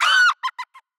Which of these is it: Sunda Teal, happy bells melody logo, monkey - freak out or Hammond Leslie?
monkey - freak out